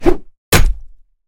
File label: skillcheck start